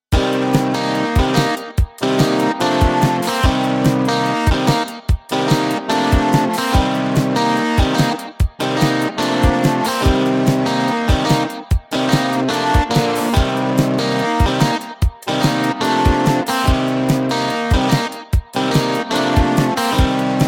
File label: GT SPANKY